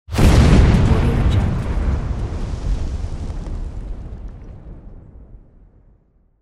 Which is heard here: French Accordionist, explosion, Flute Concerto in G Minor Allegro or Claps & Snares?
explosion